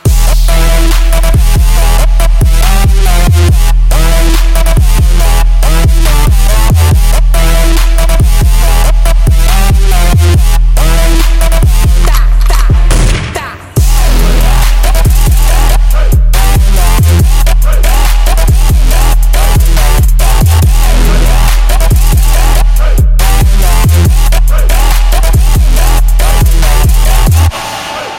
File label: Dubstep